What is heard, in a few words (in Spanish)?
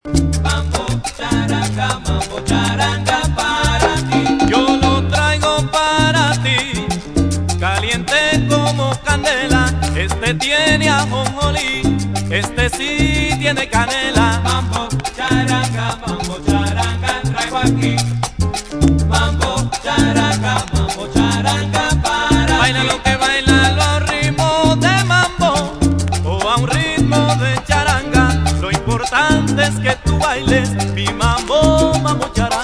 Mambo Charanga